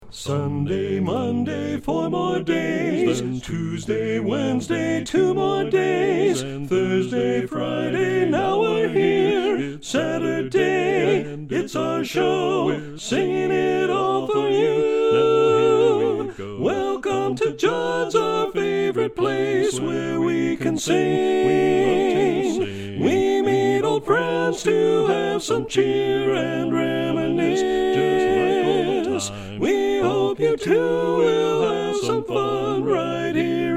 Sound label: tenor predominate